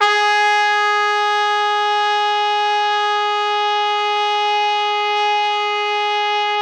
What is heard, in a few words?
G#3, Pop Brass; Best Service ProSamples vol.19; AKAI